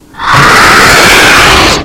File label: jumpscare sound; anaf remastered